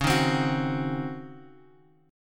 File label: Db Major 7th Suspended 2nd Suspended 4th Db down Guitar Open F; DbM7sus2sus4; strummed